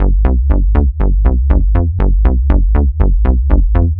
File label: Pro Bass, Bass Foundations; Sonic Foundry (Sony Creative Software); The Electronic Music Manuscript Collection